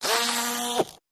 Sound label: Motor, Small; Vibrating With Buzz Nasal, Start Run Stop